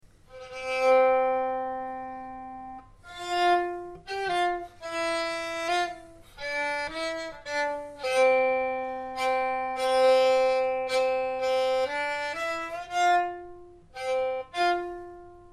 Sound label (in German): Einsaiter